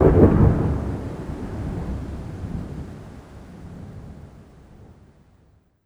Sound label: thunder med; Rust